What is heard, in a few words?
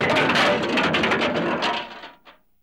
TRUCK JUN, Transportation, Truck Junk